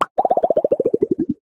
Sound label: Stylized Bubble Pops Descending; SoundsCrate